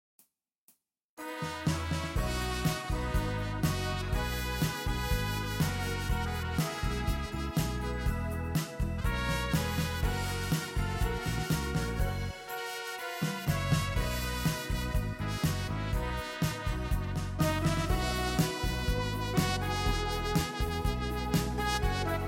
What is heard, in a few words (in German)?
Die Glocken von Tirol